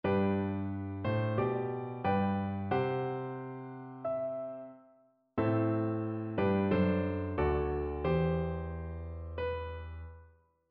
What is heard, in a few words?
modulation